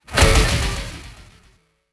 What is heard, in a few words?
Aggressive Transition